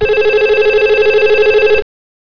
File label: ring; hotel